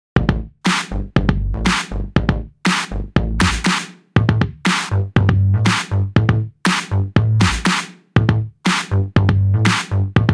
(INSTRUMENTAL)

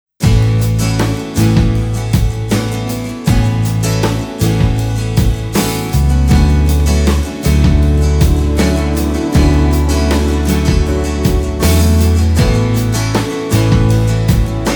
INSTRUMENTAL

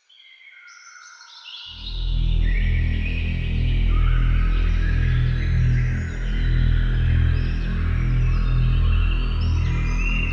SWEET ANCIENT BIRDSONG

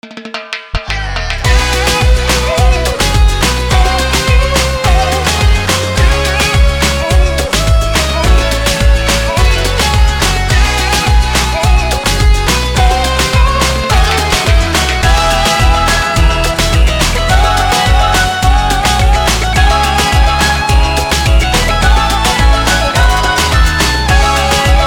POOLSIDE MOOMBAHTON

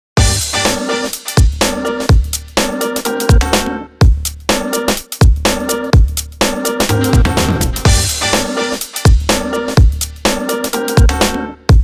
HAMMOND SQUEAK

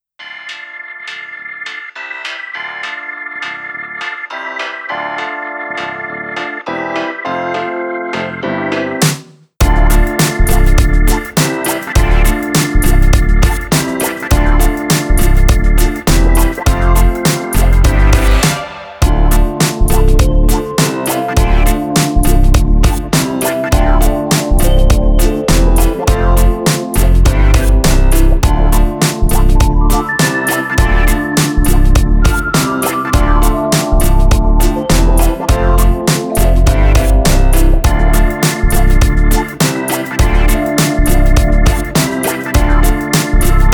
GROOVE

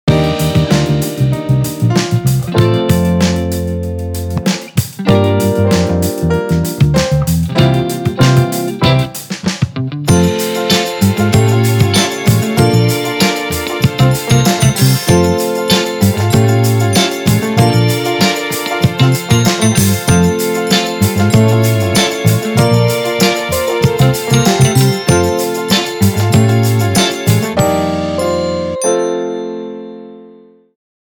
INSTRUMENTAL

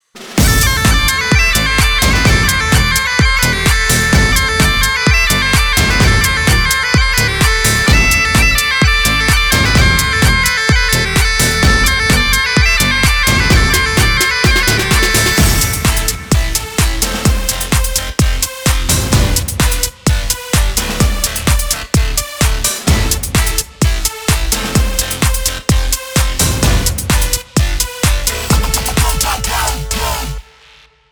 BANGING BAGPIPES

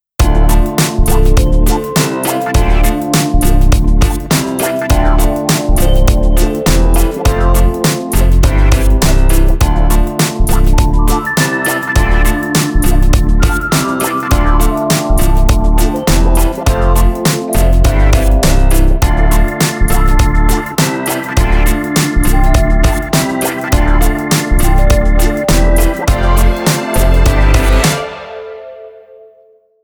GROOVE

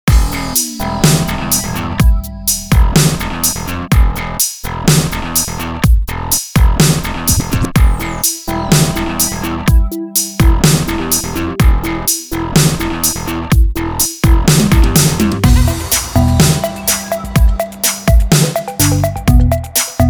halftime dub mix

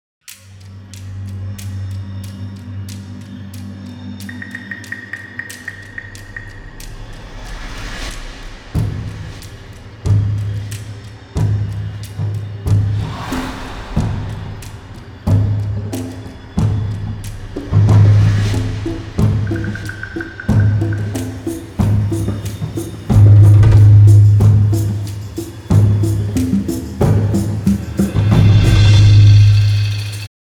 RED SWAMP, impact percussion

